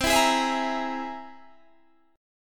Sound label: C Augmented 7th, Guitar, Standard; strummed; JGuitar